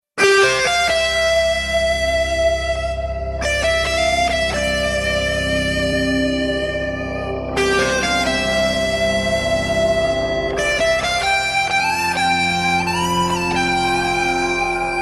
Sad Violin

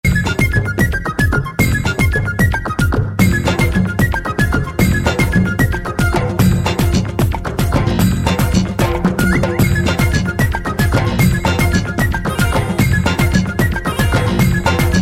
Folk Style

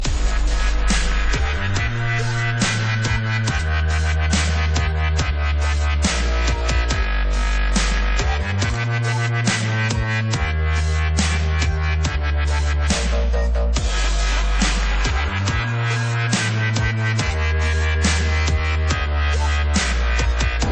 Dubstep Remix

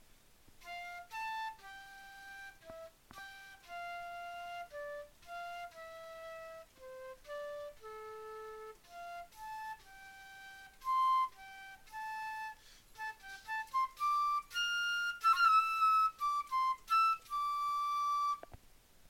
Flute; Undertale

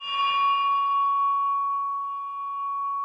Beckoning bell

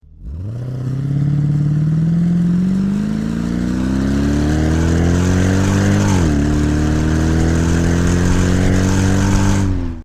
Mercedes Slk55 Amg

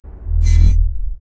Pickup Health; Halo

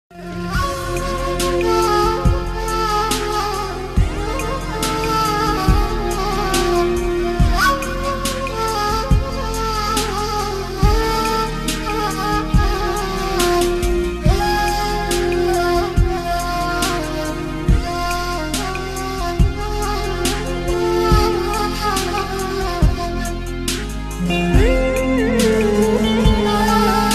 Very Sad Kurish Tone

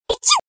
Achoo

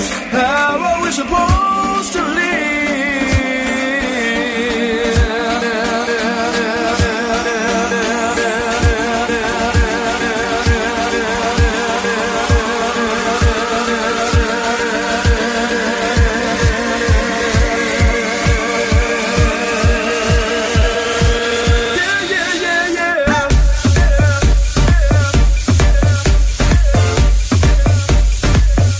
dance remix

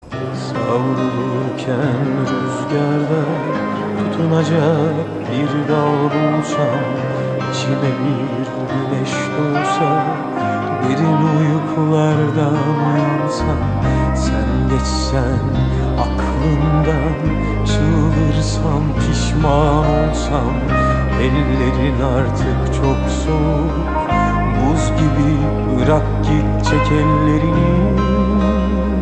Turkish Song

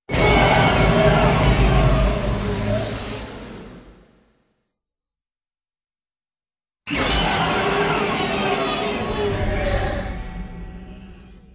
Roar; Kiryu

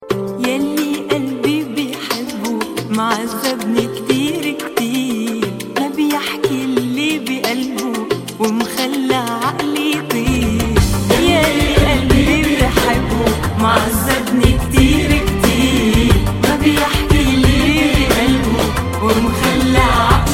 Arabic Tone

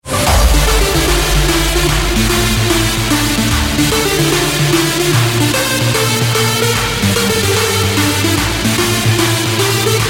Electric Trance

